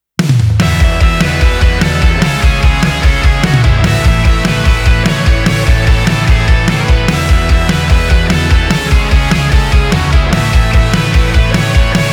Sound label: POP ROCK SAMPLE TRACK